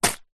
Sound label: purse pouch leather containing coins and notes catch; foley